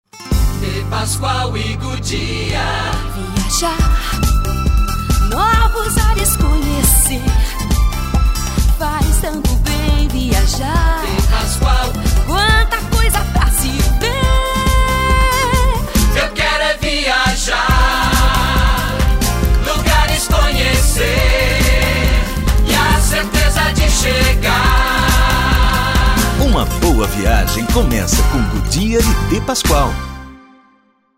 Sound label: jingle; DPASCHOAL; VIAJAR